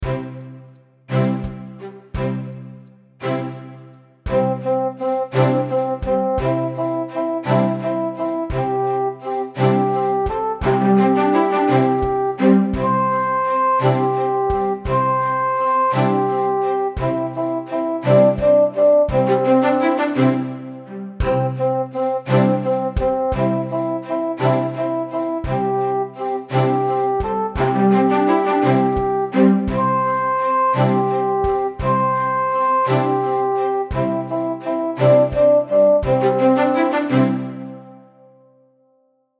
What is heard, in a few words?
Little Tom Tinker SLOW Tempo, ukulele; Rainbow Ukulele Play-Along